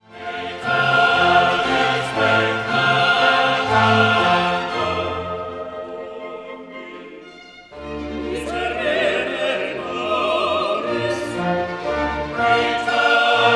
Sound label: Gloria Qui tollis